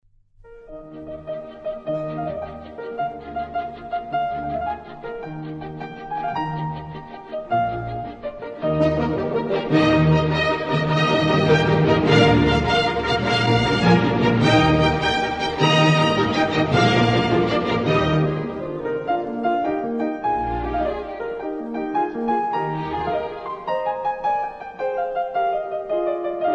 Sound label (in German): Klavierkonzert Es Dur Allegro Andante cantabile Tempo I; Nr.22